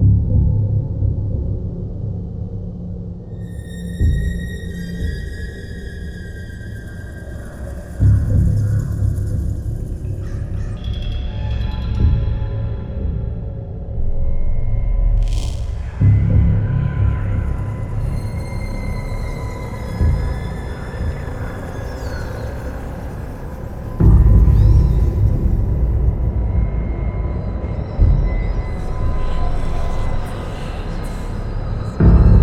main game loop; netent

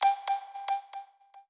count Up End; Stikky; netent

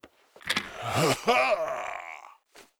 blue Bird Win Arms; netent

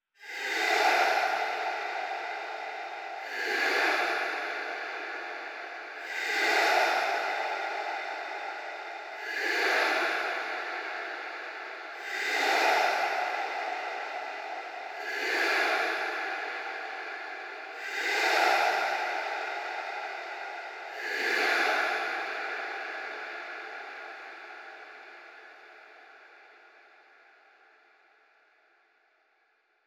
crowd chant Right; netent

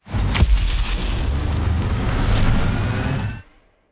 Near win Start; fruitblaze; netent

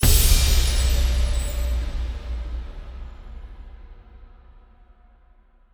free Spin Win; netent

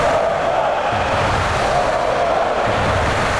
crowd loop Germany; netent

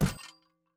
hot Spot Bounce Right; netent

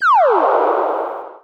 heartbeat; netent

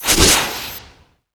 near Win Flash; netent

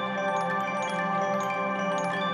Outro Ambience; netent

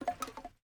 Drop start; netent

